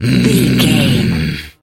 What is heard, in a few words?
voice male growl